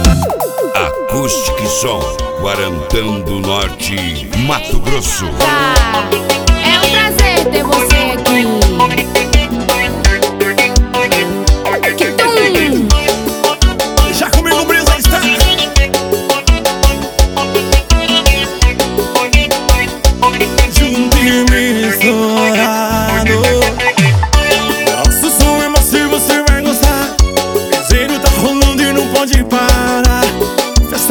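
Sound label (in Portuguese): mega funk mix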